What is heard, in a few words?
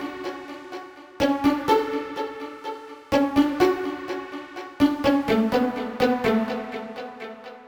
Pizz, 125bpm Bm; Zero-G; Nu Disco; Construction Kits; Fashion Week